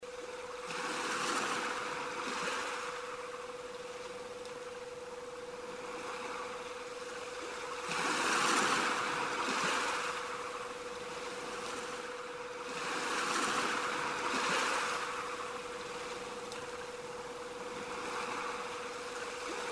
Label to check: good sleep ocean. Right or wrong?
Right